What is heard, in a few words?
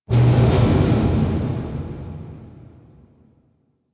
Metal Rumbles; Warframe; Quests; TheNewWar; Ambience; Zariman